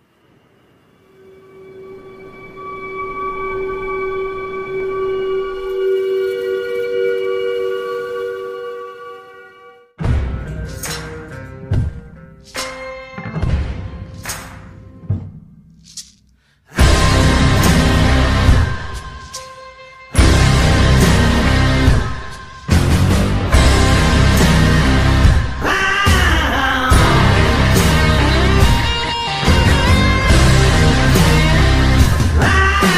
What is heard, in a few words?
ringtone background music theme ringtone; lucifer theme